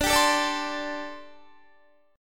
D 7th Suspended 4th D Guitar; strummed; 22,22,19,19,21,19; 4E,4A,5D,5G,5C,6F; chord

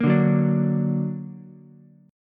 D Augmented, D, down, Ukulele; strummed; chord; JGuitar

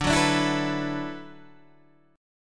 D 6th Add 9th, Guitar, DADGAD

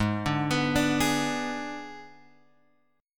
Ab Diminished Ab Guitar Open G

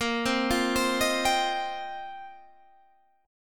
F 7th Suspended 2nd Suspended 4th

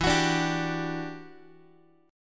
E Minor 9th, down, Guitar; Em9 strummed; x,12,9,12,11,x

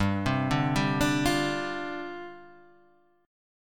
C Suspended 2nd Suspended 4th, G

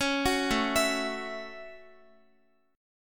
A 6th, Guitar; A6 arpeggiated; x,x,11,11,9,11

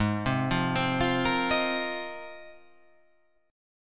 G sharp 6th Add 9th G sharp

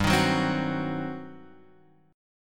G Major 7th Suspended 4th Sharp 5th, G, down

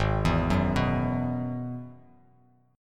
G sharp Minor 7th Sharp 5th G sharp; G#m7#5 arpeggiated; 4,7,4,4; JGuitar

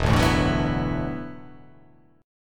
E 7th Suspended 2nd Suspended 4th, down

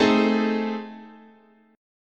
A Minor Major 7th Flat 5th, guitar chord

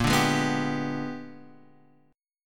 Bb 13th Flat 9th; Bb13b9

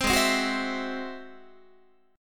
Ab Augmented 7th Ab down Guitar; Ab+7 strummed; JGuitar

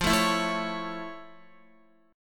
F Augmented Major 7th F Guitar; F+M7; strummed

